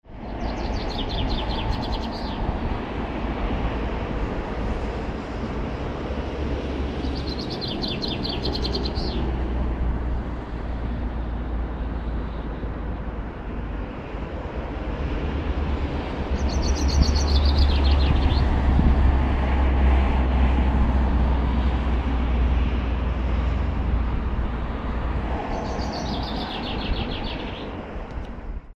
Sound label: sunday morning chaffinch